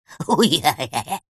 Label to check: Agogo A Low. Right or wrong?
Wrong